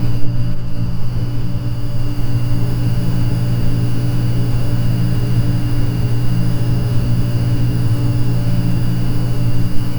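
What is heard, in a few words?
glitch, electrical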